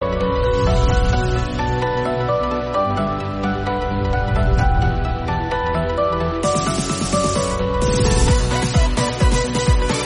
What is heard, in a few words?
mass bgm